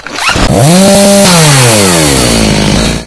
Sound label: chainsaw start